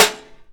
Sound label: Hit Golfball Metal; SFX Kit; Misc Sports